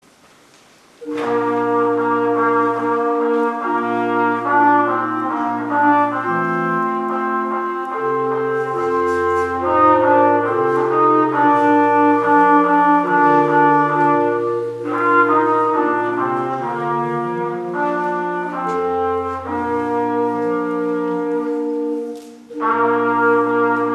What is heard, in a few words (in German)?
Trompetenmenuett; Adventsmusik